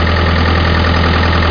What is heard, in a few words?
truck medium; SpecOps2